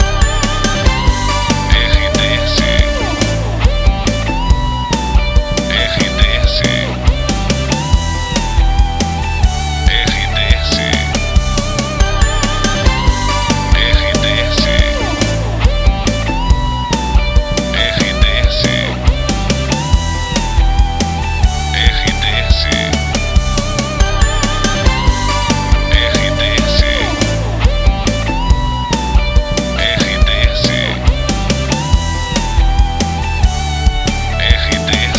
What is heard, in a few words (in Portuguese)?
TRILHA PARA LOCUCAO